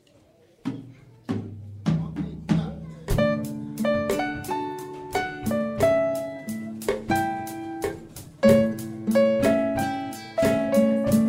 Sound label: Samba lele